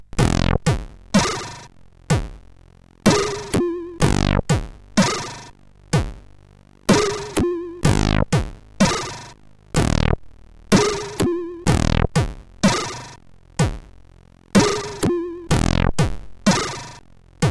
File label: Little Game Tune, CSG8580R5